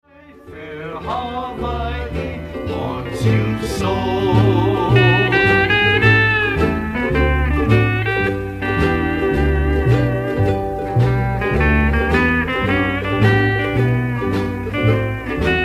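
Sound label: slowed down, steel guitar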